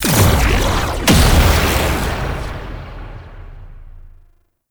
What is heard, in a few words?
Menu Select; Warframe Menu; Mag; Awakening Remaster